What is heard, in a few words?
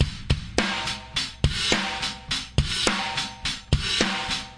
105 gate rock, drum loops; 101 to 110 bpm